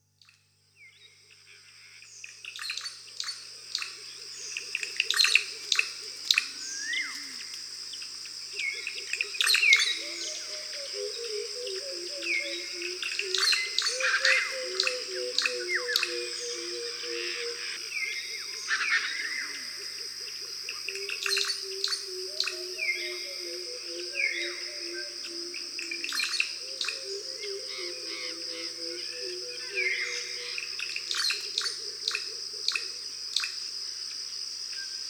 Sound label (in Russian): zvuki prirodi sulavesskaya kustarnitsa ivolga maleo kozodoi kukushka